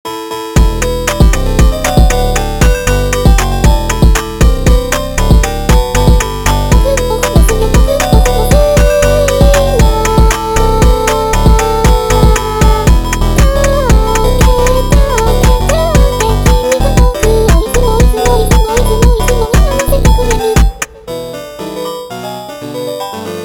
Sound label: funk